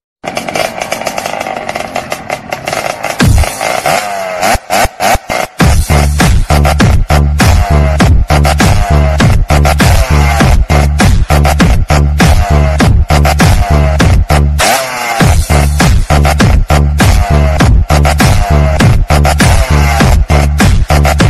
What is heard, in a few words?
Mobile Ringtone